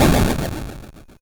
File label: Drums, Hits; DCM8